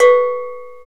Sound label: PRC LO COWB, Cowbells; Roland - Rhythm Section; Latin 1